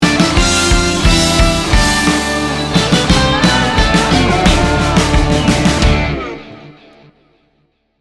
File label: swamp jam sting bumper